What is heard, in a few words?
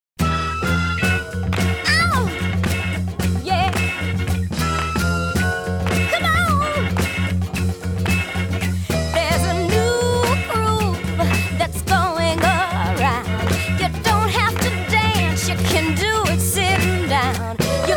Hand Clapping Song